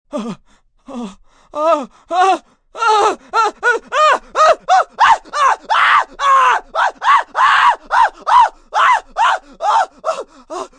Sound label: Scream Building, Scream, male, long, walking on hot coals, fear, terror (human voice)